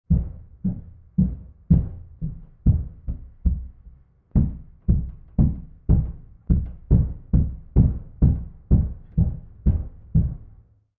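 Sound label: Footsteps Female Stomping F, stairs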